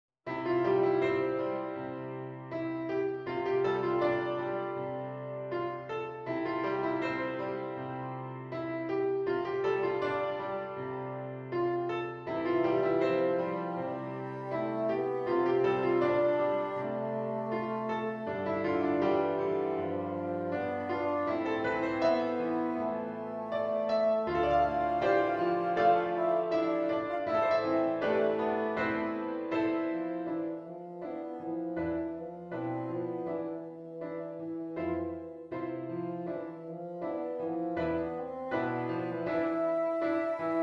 Bassoon

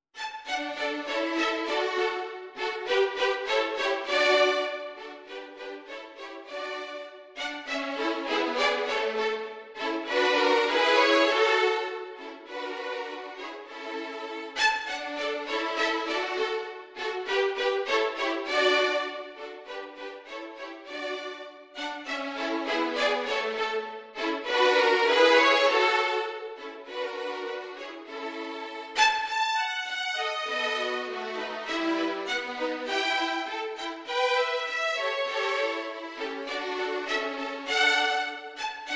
vln trio; Allegro; violin trio; mozart

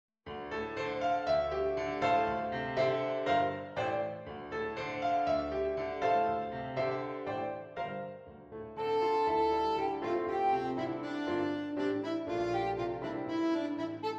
Sax; alto tenor or baritone saxophone

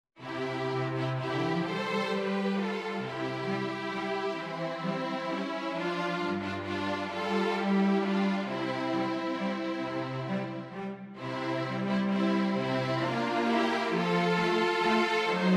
String Trio

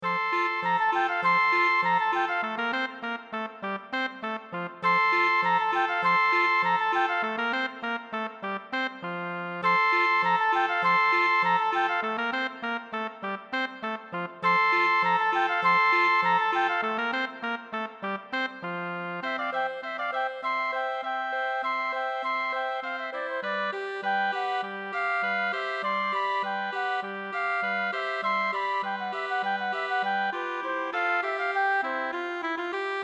Musette WW Trio

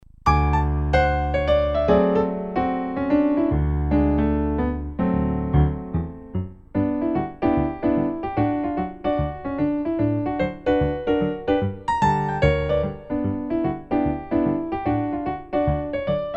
jesus wants me, solo, intermediate piano solo; jesus wants me for a sunbeam

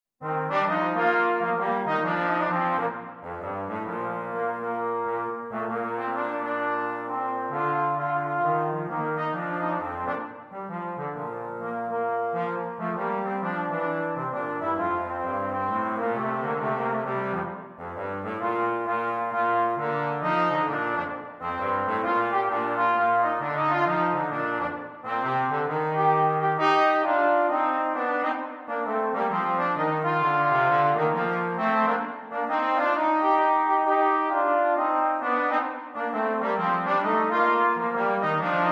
jazz Trombone Duet; hark the herald angels swing

